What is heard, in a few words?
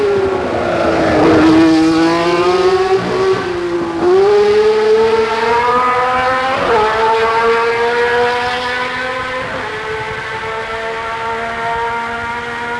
E30 M3; Adenau